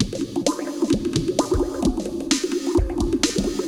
Moog Phaser HR-16B (130BPM), cyborg beats; musicradar

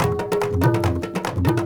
INDIA L; Spectrasonics - Heart of Asia CD2

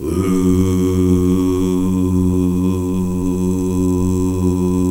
Gosp. Oooh; Sound & Vision - Gigapack II CD 1 (Roland); HAL Gosp. Oooh 2

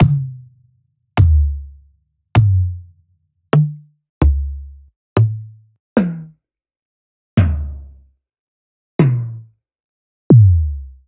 toms; ghosthack

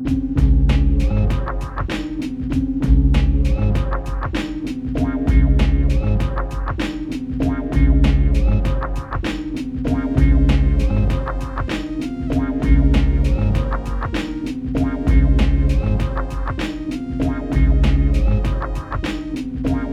97 BPM E, Hip Hop; InVision Interactive; 40 Oz Phat Slammin

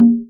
conga open; Sonic Foundry (Sony Creative Software); Ugly Remnants VOL-2; Beatstuff

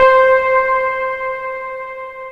D5O OR JUNO, ROLAND D-50; Rubber Chicken Software Sample Bank

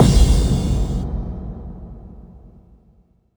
BD-FX, Dance Mega Drums; Best Service

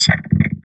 VOX, Electro Vox; Sound & Vision; Gigapack II CD 2 (Roland)

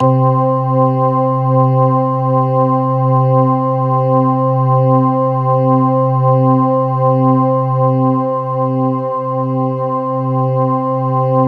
C3, synth; 90 s Sample CDs; NiceBeats; SynthLine VOL-28

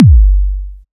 Kick, Drum Hits

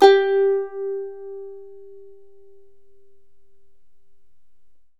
CHARANGO G1; The Andes

